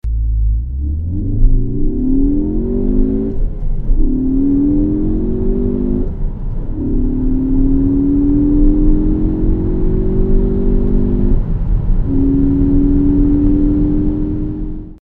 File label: Focus ST ACCELERATION